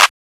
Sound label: Clap